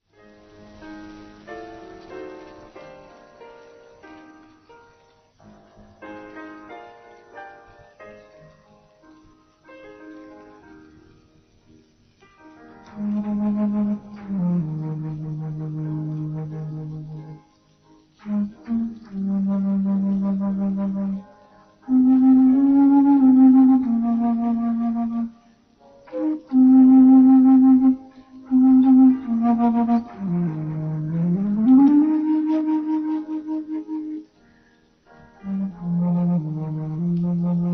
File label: flute